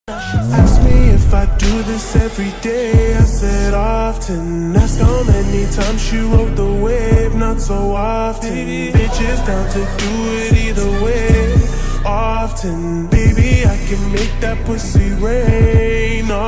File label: Slowed Ringtone